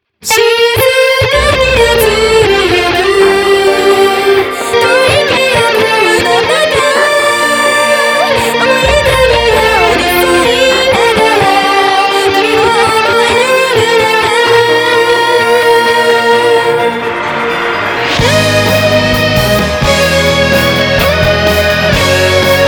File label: Guitar Cover; Fate Zero